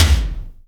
Toms, Real

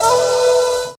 wolf flute call, Stabs

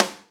tight, Rimshot, Snares

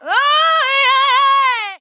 "oh yeah" SUNG

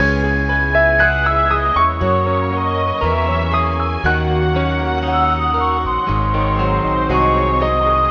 piano, Loops Sad

